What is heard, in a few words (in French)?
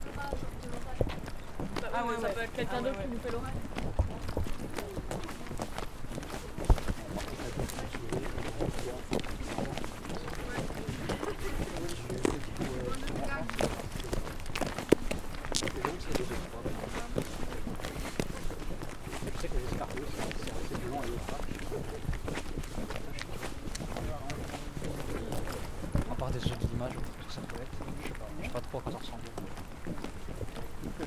balade au millieu des partiels, campus universitaire de la bouloie